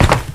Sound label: land